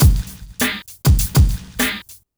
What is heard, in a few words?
drloop, drumloops, Vinyl Attack; Masterbits